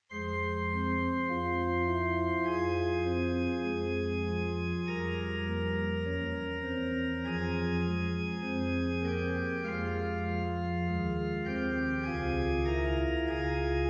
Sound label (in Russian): хоральная прелюдия фа минор